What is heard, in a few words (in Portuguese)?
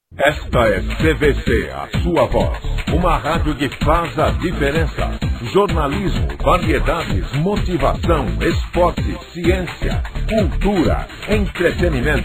vinheta